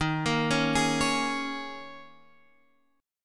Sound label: D sharp 7th Suspended 4th; D#7sus4; arpeggiated